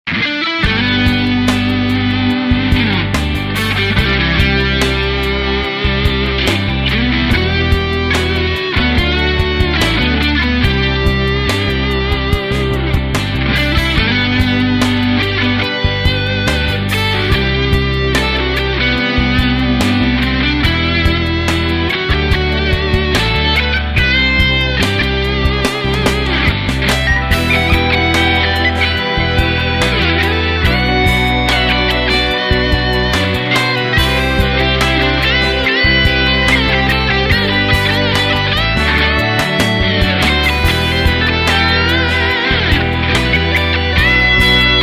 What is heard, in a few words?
Ballad Jam w solo